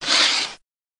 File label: Tripod Leg Sml Smoot